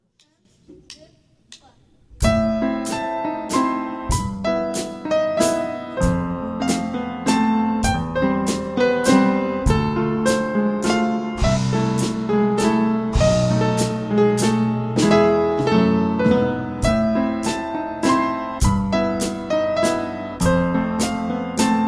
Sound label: Melancholy walz